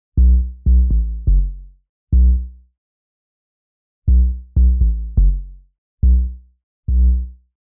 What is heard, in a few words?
123BPM SPARSE BASS Bmin; Bass Loops